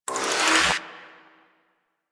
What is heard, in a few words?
Harpoon Gun Charge; Warframe; Sharkwing; Grineer